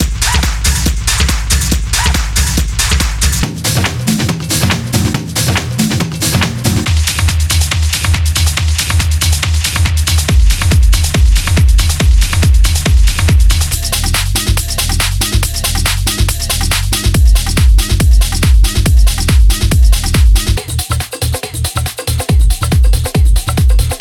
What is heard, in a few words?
pressure loop; Hardgroove Pressure Loops Vol 8